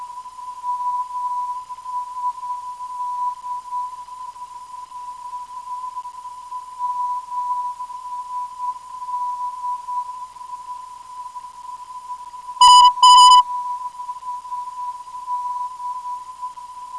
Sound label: MSD 383.83kHz